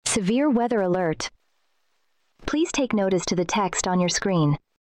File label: tone; alert